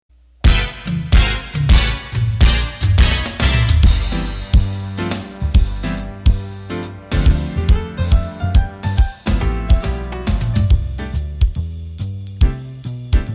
Big Band Up Tempo

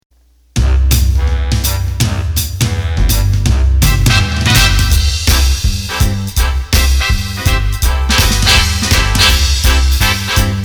Swing, accordion; roland bk7m

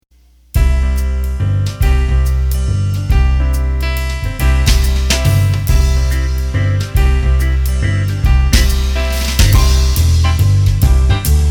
Jazz Waltz, Roland accordion; roland bk7m

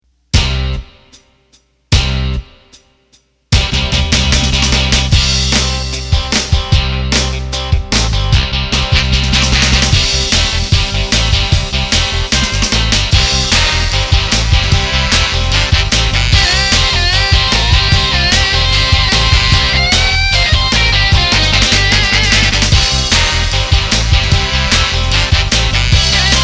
Rock; roland bk7m

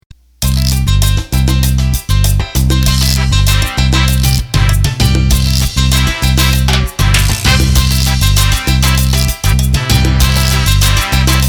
Salsa; roland bk7m

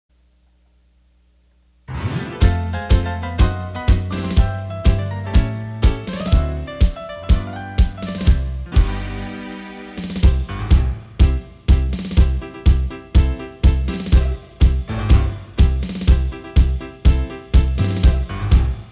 Tango

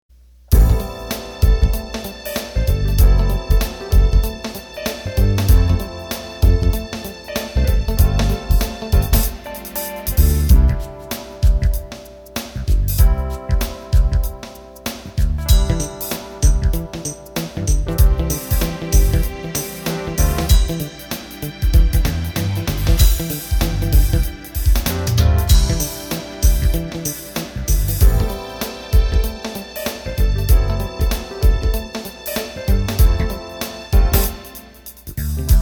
Beat Slow

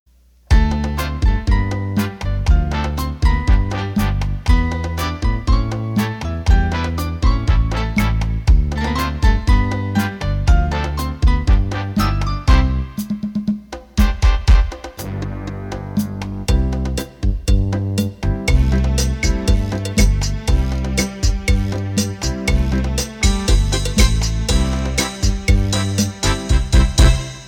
Cha Cha

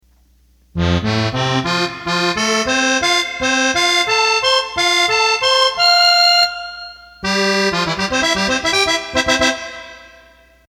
Accordion

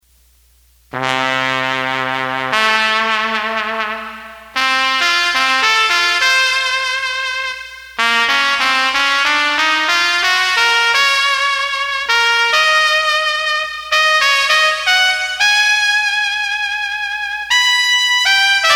ketron Trumpet Mexican

